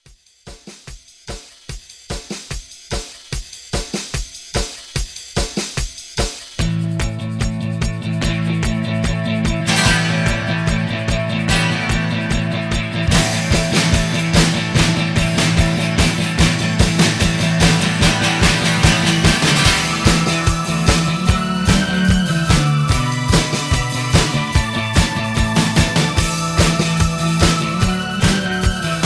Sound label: 80s Rock Re Drum